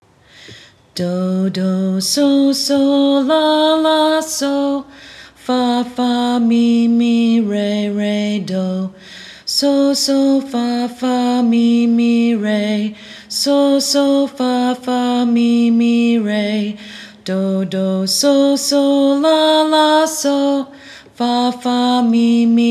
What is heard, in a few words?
Twinkle Solfege